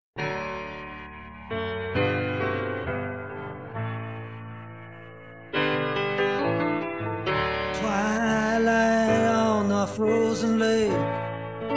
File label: Vocal Piano